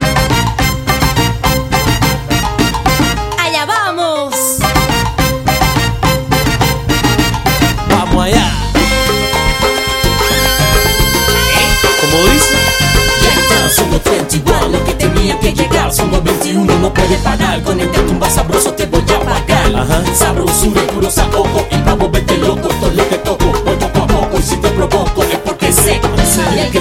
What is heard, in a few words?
salsa songo